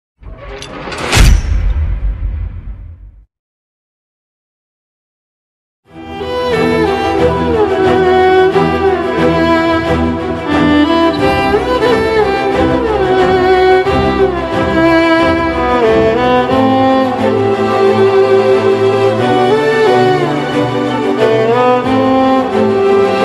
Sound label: sad bgm; maha samudram; siddarth death